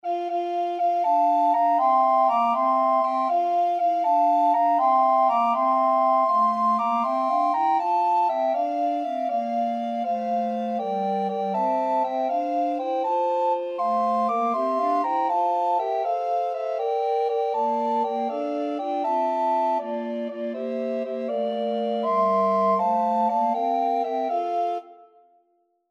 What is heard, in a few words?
recorder quartet